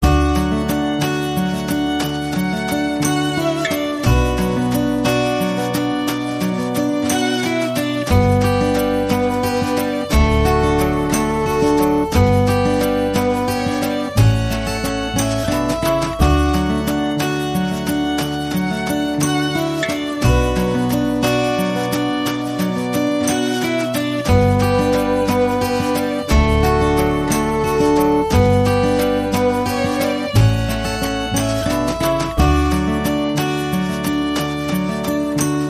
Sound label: Heart Strings and Violins; 60sec